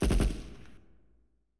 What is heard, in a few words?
dist gun burst